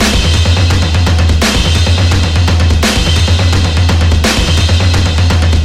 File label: drums; Left 4 Dead 2; zombat; horde